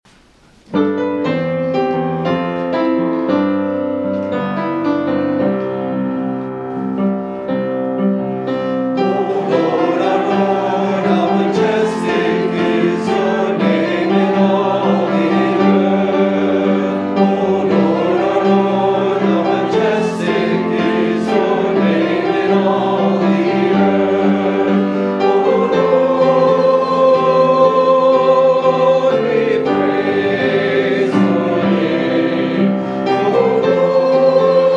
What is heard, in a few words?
hymn; O Lord, Our Lord, How Majestic